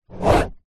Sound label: pen ball point write single stroke on empty card box; office